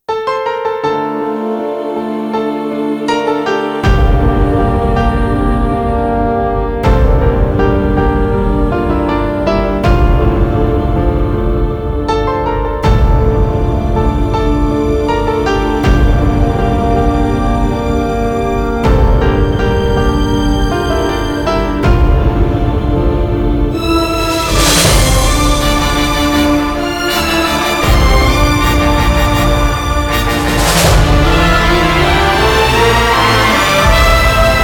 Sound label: (Instrumental); Veer-Zaara